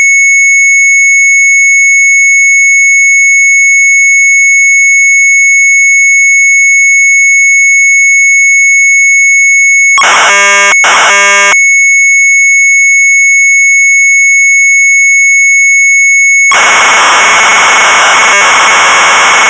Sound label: Sharp MZ800 Tape; SHARP SHUFFLE; retromelsarchive